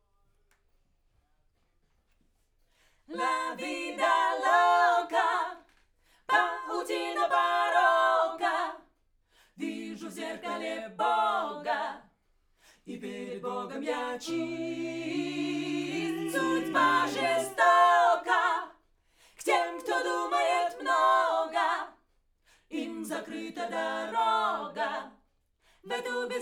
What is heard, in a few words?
Backs; SCHOEPS